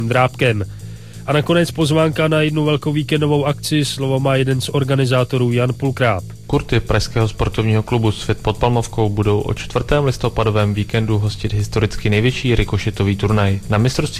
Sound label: beat